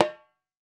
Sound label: REPIQUE; Latin Drums & Rain